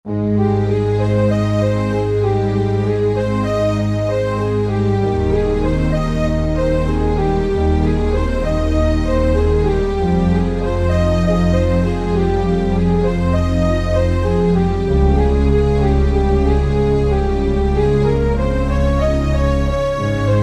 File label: Scoring Horns; Korg TR-Rack